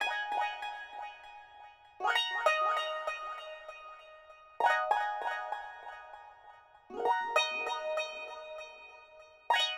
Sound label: Koto, Hip Hop Exotica, 098 Emin; Apple Loops; Big Fish Audio